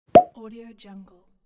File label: bung; welltone